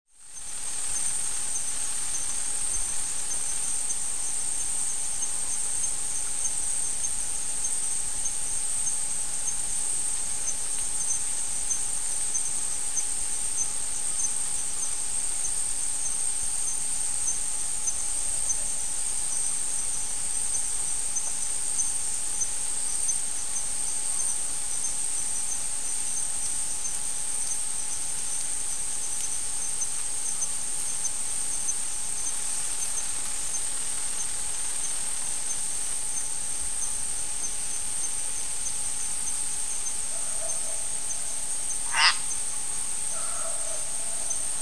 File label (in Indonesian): suara alam